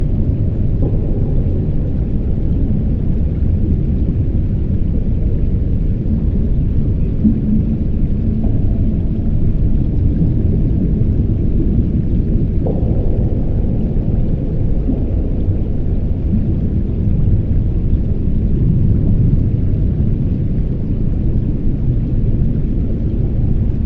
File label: AMB sewer; ゆめ2っき